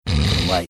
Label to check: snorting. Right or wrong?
Right